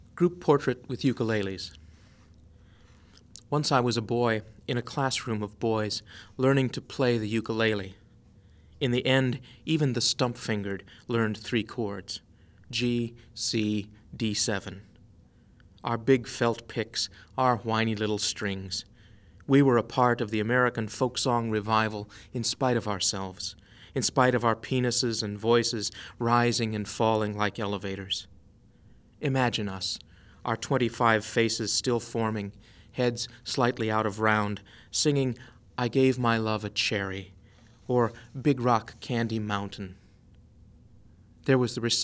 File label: ukuleles